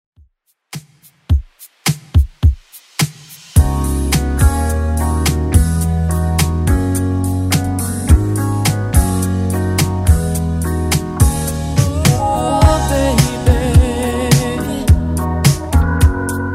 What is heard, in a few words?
80s Redrum 106 bpm